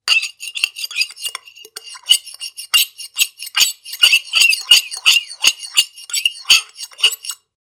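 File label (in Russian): звук вилки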